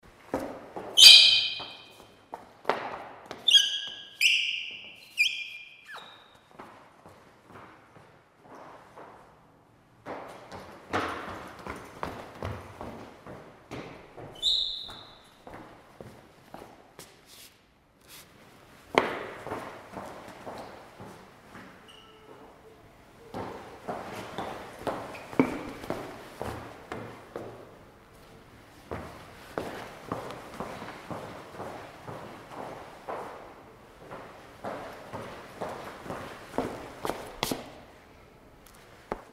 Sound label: Steps